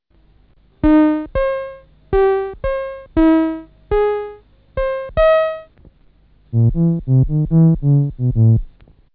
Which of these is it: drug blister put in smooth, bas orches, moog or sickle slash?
moog